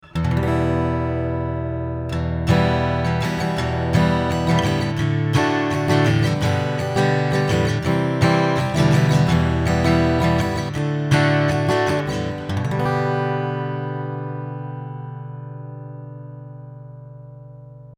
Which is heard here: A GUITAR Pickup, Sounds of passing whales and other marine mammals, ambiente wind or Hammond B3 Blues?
A GUITAR Pickup